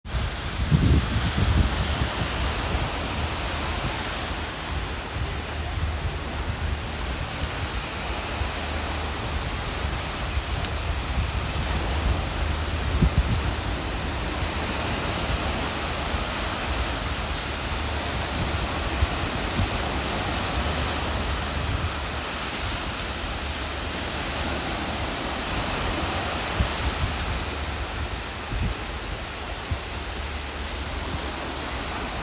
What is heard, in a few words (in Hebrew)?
ים